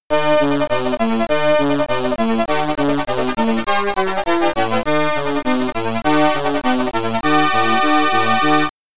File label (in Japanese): ゲーム・オーバー・ミュージック